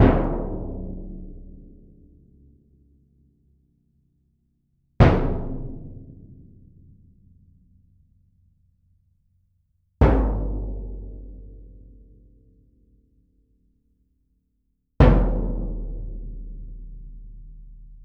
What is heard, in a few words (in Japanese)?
膜鳴楽器 打奏 Bass Drum; BassDrum比較